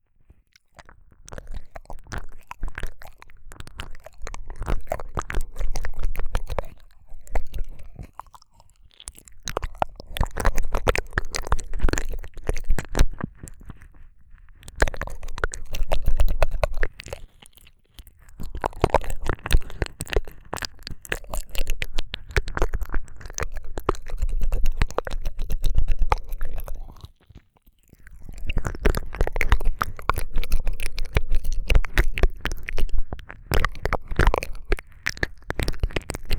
耳舐め, ASMR

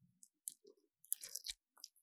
射精音付属音

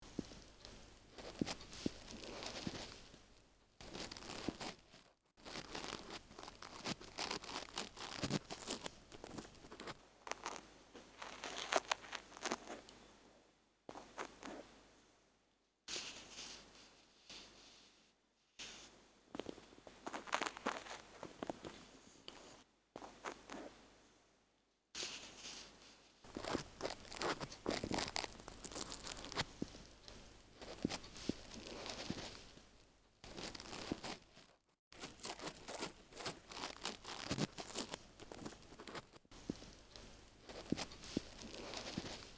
耳かきL（耳鍼モデル＋塩＋耳かき）; 夏実の耳かき

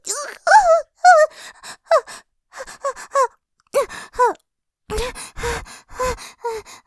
絶頂◆激しくイッちゃう、びくんびくん; ループで楽しむ！シェフの気まぐれ抜き抜きコース

